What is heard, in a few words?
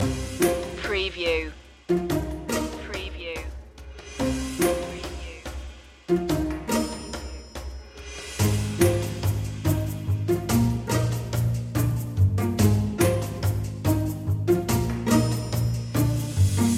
curious machine loop